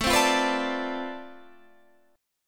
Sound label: D Minor 13th A